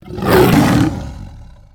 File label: Attack